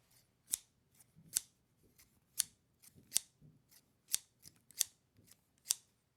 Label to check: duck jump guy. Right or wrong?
Wrong